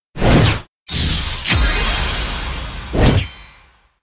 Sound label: vip upgrade